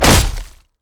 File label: basic attack knee; rengar